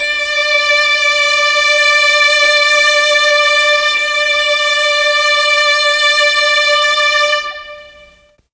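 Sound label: D4 violins, mellotron; CHMB 3 VLNS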